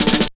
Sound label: SNR BUZZ; East West - Phat & Phunky